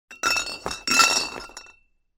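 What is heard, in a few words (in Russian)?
hit against each other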